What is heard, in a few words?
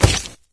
body medium impact soft; source engine; tf; physics